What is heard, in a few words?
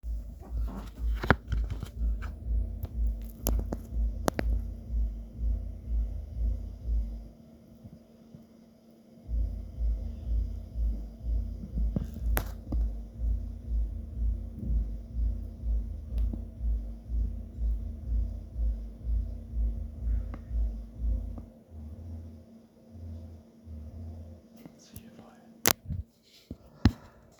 Bass